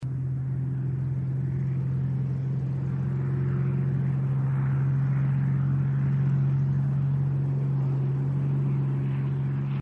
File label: Small Plane Overhead